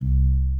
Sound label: button, jingles; gbjam12